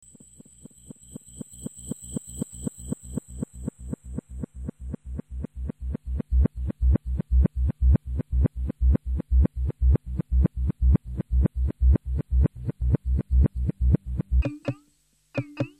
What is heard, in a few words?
Ring Dub